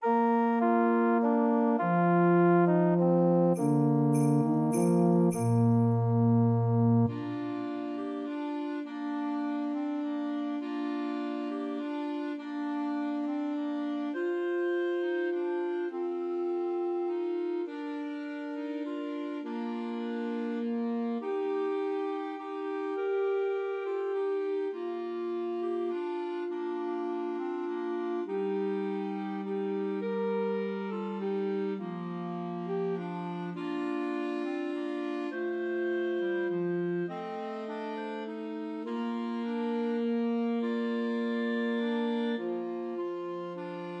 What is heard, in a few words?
Trios for Two Clarinets and Alto Saxophone; Silent Night; Christmas